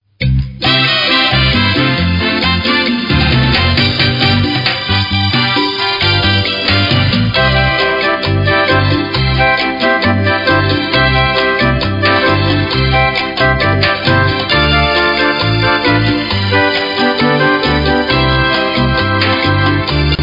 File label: Cha Cha